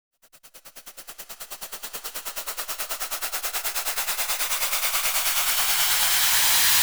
Sweep, Fx, Sweeps, Trance; Zero-G Trance Inducer

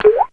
Drop, Fairlight CMI III

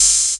hat open high hiss, Chicago House Drum Hits

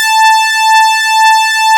LEAD, Nord; Wizoo - Powered Nord; LEAD PAD HRN

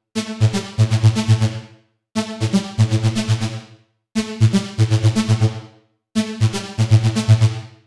Progressive Synth, Trance

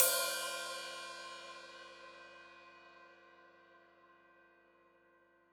Hat Open, Hi-Hat; Deeply Sampled Drums

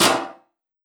snarelike; Sonic Foundry (Sony Creative Software); Ugly Remnants VOL-2; Beatstuff

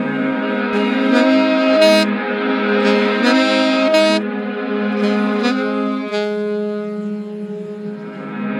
Sax Effect; Effected; Sonic Foundry (Sony Creative Software); Jazz Solos and Sections

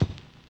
RAGGA; Spectrasonics; Metamorphosis; RAGGAVYNL

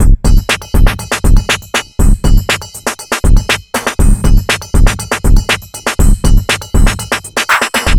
funky hip 120bpm, Downbeat Dance Grooves

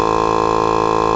REGAL; CMI Sound Library Rev 1.3

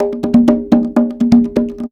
Percussion Loops, Bongo & Conga; Houseworx

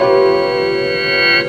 Substain Stab, Keys; Zero-G Jungle Warfare 1, 2 & 3; 90 s Sample CDs

